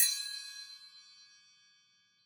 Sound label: Finger Cymbals; World Percussion; Cymatics; Emperor World Percussion